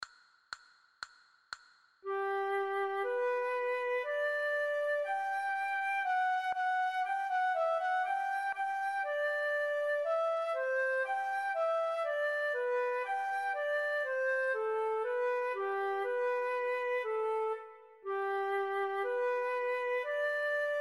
Andante, flute a bec; methode pour apprendre a jouer

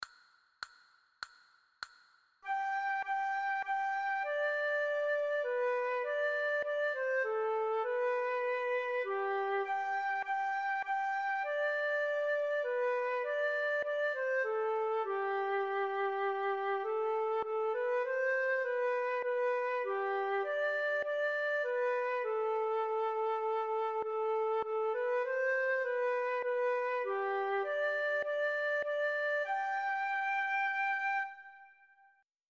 Sol, flute a bec

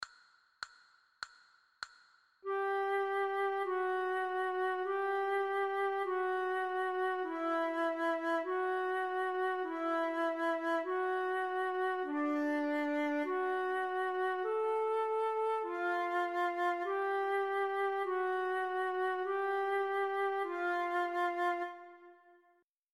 Fa diese, flute a bec

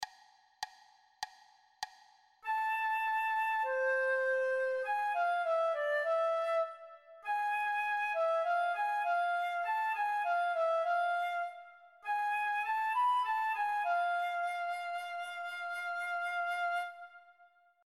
Sol diese, flute a bec

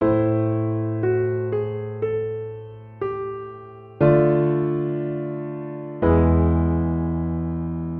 note passage

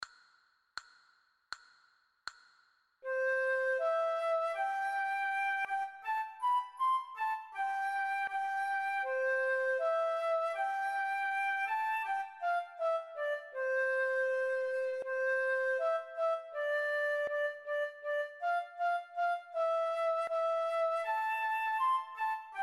Le coucou, flute a bec

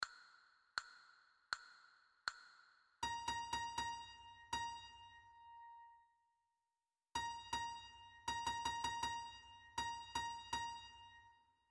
Si b, flute a bec

